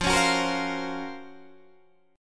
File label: Gb Major Sharp 11th F